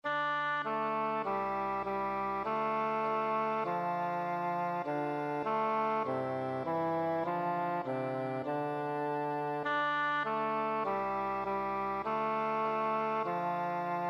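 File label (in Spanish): Dioses del Olimpo flauta tenor; 5º Antiguas Culturas, 5º Historia y Narraciones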